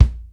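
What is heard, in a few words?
bass drum snap; 300 Drum Machines; Yamaha Motif ES-Series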